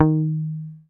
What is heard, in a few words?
MUTE D#3, Bass; Sampleheads; Will Lee Bass Library